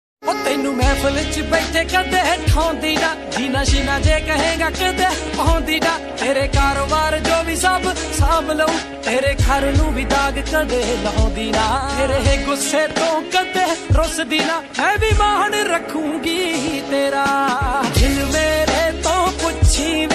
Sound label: Dance Punjabi Ringtone